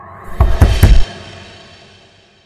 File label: knock; civ13; civ14; Magic